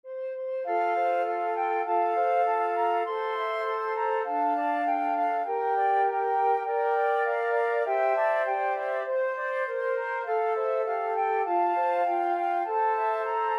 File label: simple gifts, flute quartet